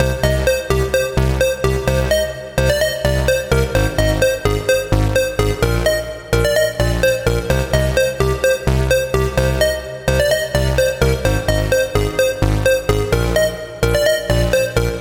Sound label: Retro Star Lead